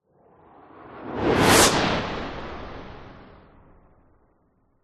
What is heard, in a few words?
science fiction space fighter fly past fast